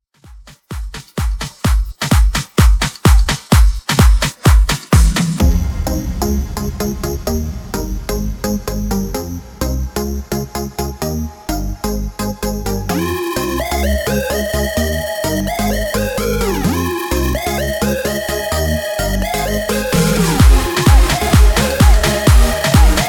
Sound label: Re drum